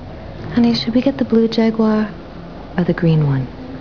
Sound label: Jaguar Car